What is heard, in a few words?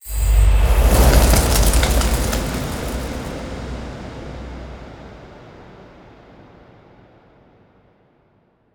environment temple room fire